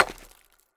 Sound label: Stone Impact; Legend of Keepers